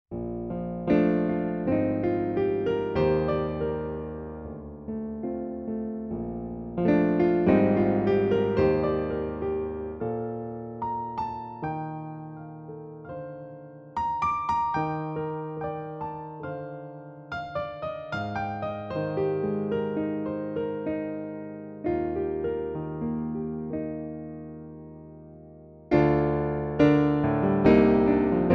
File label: Bosendorfer 290